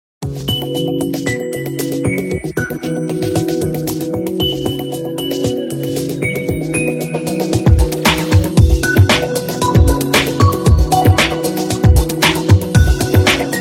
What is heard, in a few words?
nokia edge ringtone